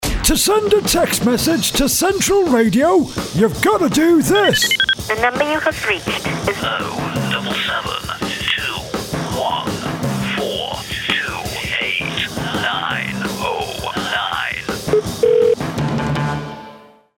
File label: Radio Telephone number JINGLE; Central Radio